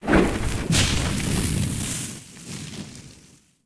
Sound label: Armor